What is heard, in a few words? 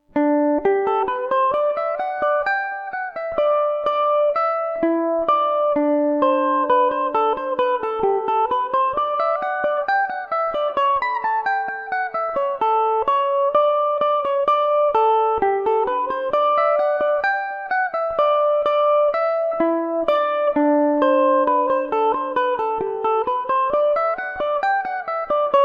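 G major revelation, Irish mandolin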